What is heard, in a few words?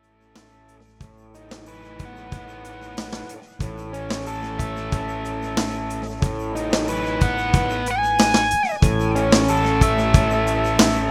Tube Screamer; Psionic314